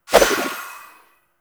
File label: Kunai Whoosh, Weapons; Warframe; Choir